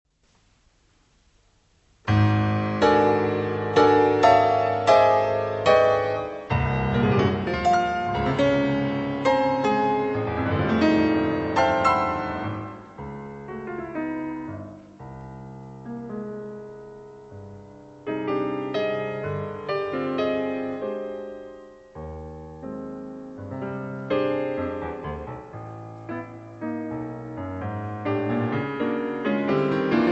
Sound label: Pick Tango